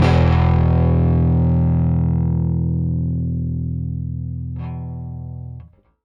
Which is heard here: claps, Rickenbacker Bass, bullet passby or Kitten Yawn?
Rickenbacker Bass